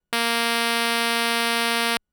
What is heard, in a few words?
Pulse B A#3; fleet keys Samples